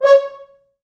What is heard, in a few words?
FrHorns stac